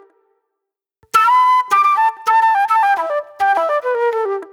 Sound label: Agro Fusion Flute